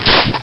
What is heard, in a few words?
bite attack; rheptor